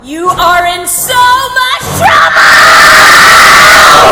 screamer; nicole watterson

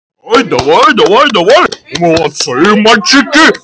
clap davai davai

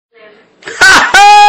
ahahhhh

